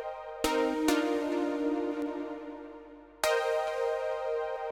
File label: Chords; simple session